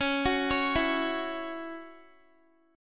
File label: Db Minor Double Flat 5th Db Guitar; arpeggiated; Dbmbb5; JGuitar